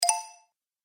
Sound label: Musical Game Elements, Video Game